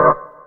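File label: organ, Stabs; E Lab; XTc Files of House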